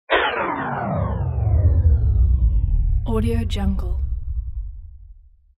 power die big electrical outage; prosoundfx

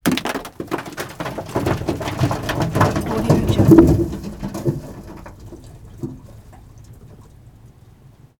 wood crack

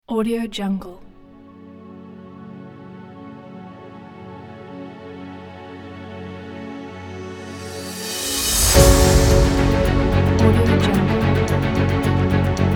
action adventure kit

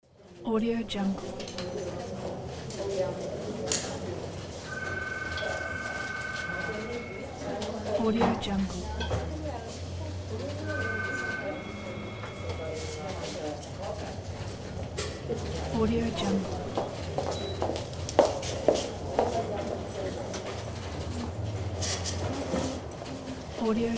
office ambience

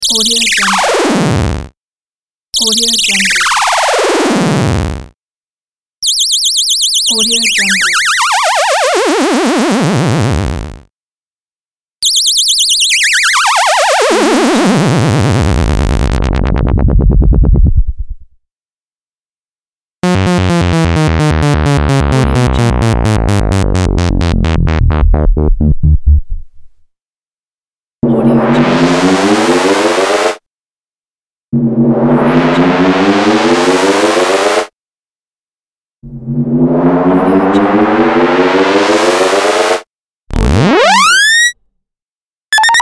futuristic cartoon sounds

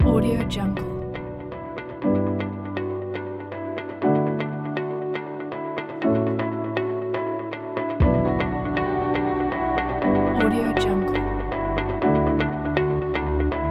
aspiring and uplifting corporate background

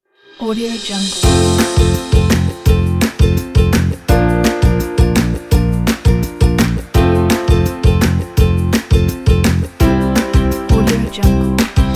happy upbeat

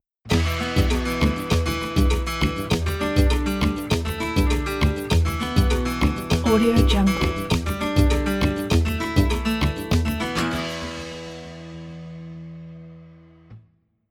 guitar logo

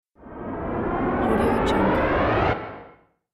cinematic sound design

